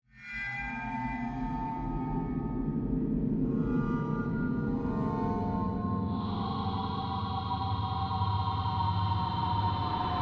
Composition Horror, Production, Element, Composition, Horror, Metallic, Swells, Haunting, Hits